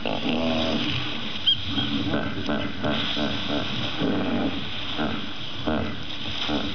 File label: hippo; luangwa